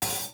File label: hat, open hat